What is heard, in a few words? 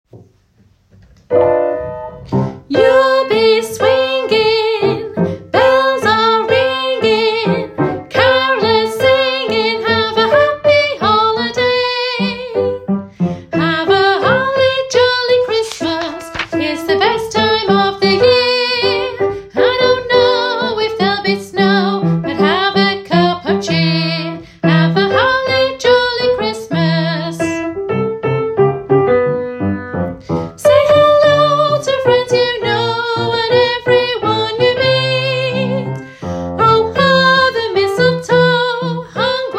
bass part